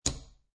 Poker Chips Place One Chip Onto Stack Of Chips Wood Table; Gambling